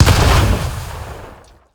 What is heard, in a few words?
Boom Underlay Death